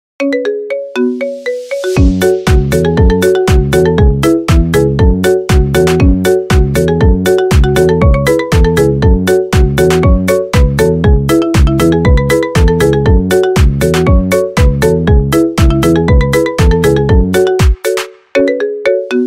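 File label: polka marimba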